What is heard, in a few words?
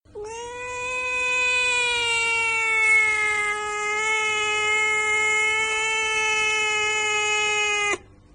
quite a long meow